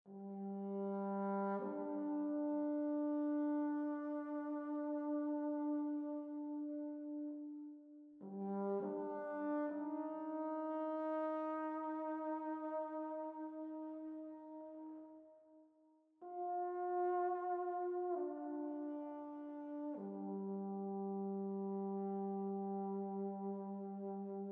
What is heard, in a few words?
ambient music